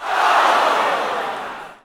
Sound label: Boxing Crowd Reaction; Crowds